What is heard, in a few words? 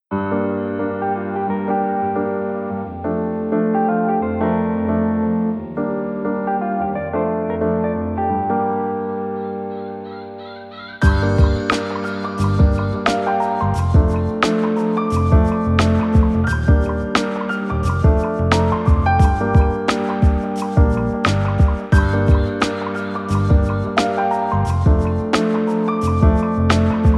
Piano